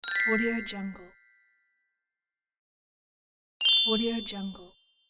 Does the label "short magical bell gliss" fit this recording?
Yes